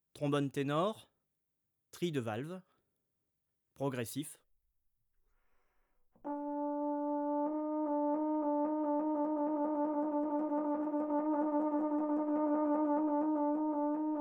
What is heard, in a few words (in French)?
ténor trille de valve, trombone; Effets; Trilles battements; traite orchestration